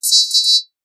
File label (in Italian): ciottoli stat; acque